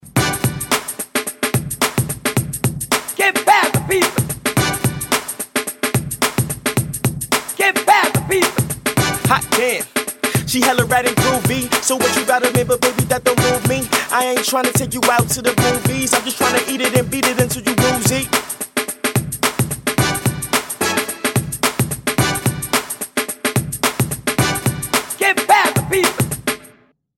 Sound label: Swinging funky drums